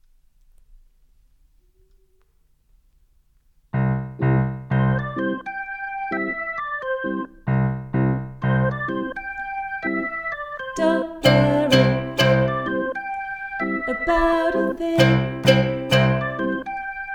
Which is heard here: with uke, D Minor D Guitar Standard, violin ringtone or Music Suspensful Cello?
with uke